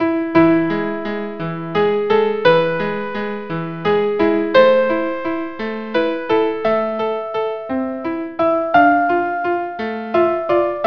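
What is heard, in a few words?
duo with viola; waves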